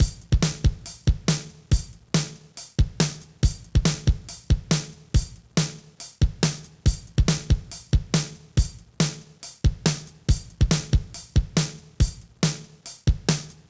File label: 8bit 22050hz